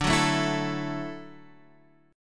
D-Major Add 9th D down Guitar Standard; strummed; JGuitar

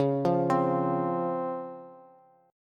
Db Major 7th, Bass; arpeggiated; chord shape; JGuitar

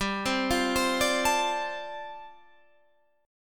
F 6th G; F6/G arpeggiated

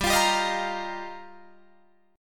G Minor 7th Sharp 5th, Guitar, Drop D

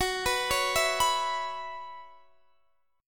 F sharp 7th Suspended 4th, Guitar, Open D

